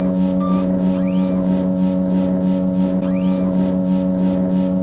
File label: Boss Apparition